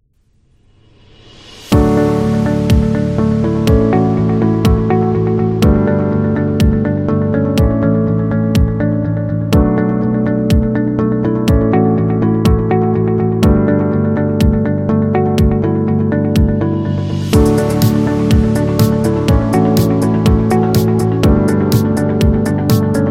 Positive Educational Background

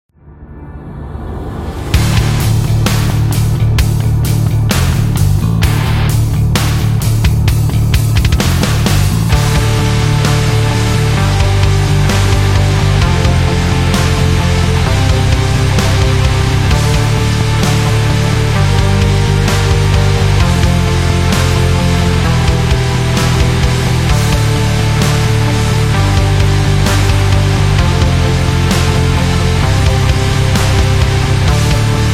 Dark Side, metal

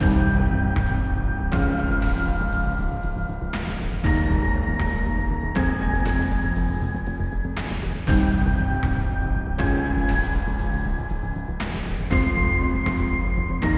Trailer; epic action

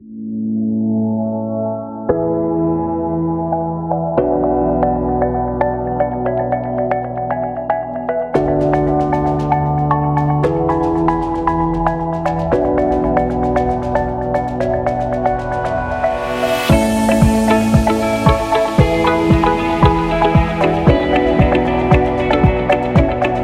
A Corporate

